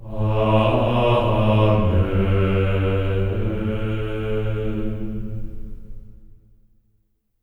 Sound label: AMEN A3, Symphony of Voices; Spectrasonics; GR AMEN